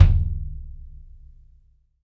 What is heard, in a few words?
Ludwig 26 Kick; RED ZEPPELIN